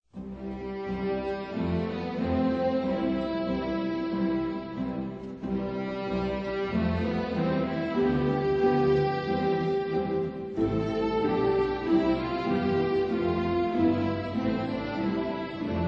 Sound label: March IV; Elgar; Pomp Circumstance